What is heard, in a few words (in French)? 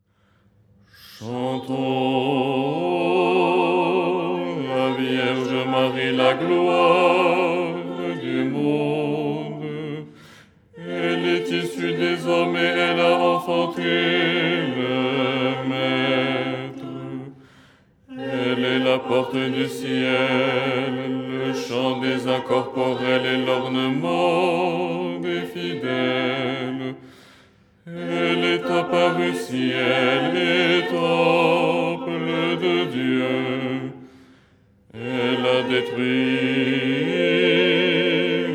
basse